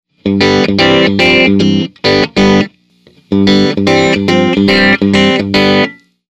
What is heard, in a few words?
clean off on